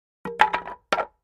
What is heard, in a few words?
2x4 Plank Dropped On